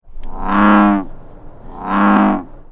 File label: American Bullfrog - Lithobates catesbeianus